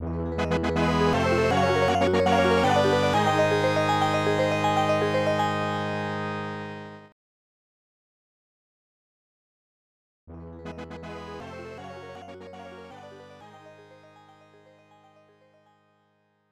Level Clear Fanfare

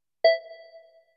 cursor Desktop (Cursor Movement); dot hack

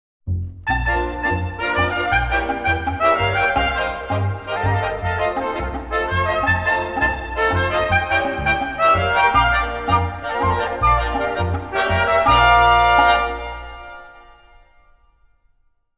rumba; Neko no Ongaeshi

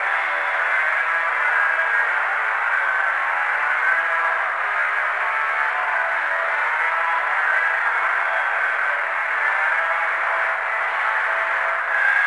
RADIO MUSIC; Headhunter; dreamcast

